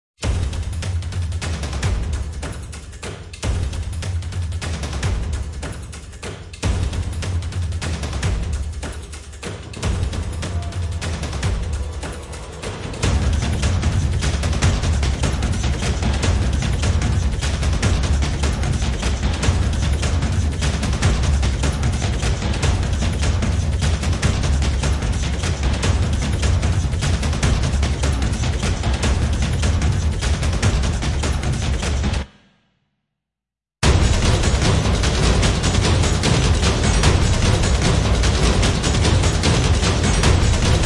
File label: Percussion; Fired Earth Music